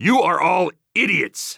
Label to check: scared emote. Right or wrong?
Right